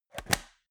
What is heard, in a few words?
metal box small close hard; maschinen; soundpool